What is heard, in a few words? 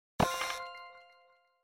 Lootbox; Death by AI